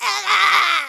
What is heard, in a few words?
Shock; Link getting shocked